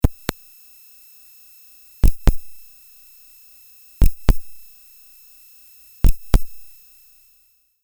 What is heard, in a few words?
Metasonix D 2000 Pitched Drum Resonance